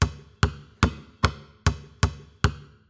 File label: basketball dribble